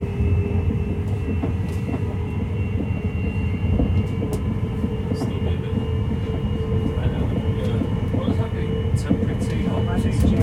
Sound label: Train Interior, UK Train Interior Ambience; InMotionAudio